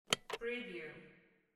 coffee maker switch

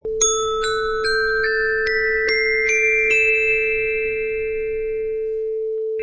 Xylophone Ascend

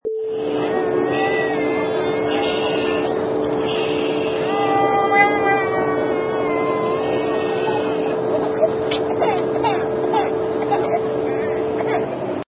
Baby Cry on Plane

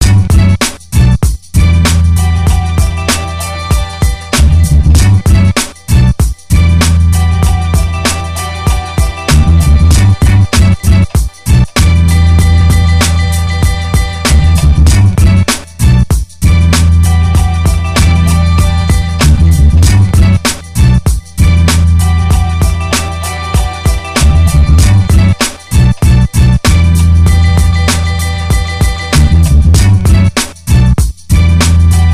beat

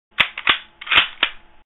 Mauser G98

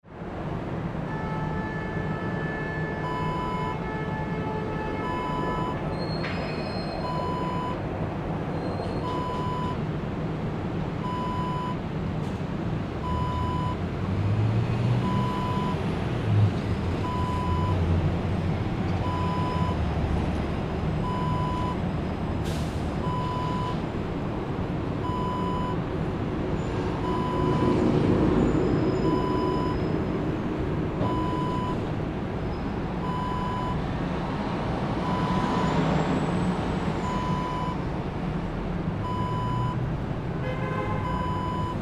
city rooftop ambience

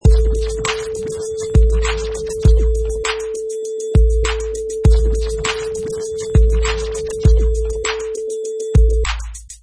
Vinyl Fast Scratch 100 bpm with beat

